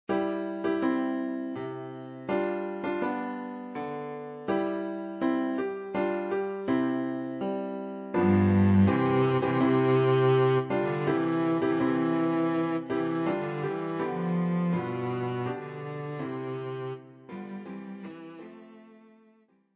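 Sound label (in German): O Tannenbaum Violoncello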